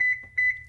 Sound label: Toyota Camry Remote Unlock Door Beep